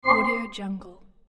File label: warm ambient video game browse menu sound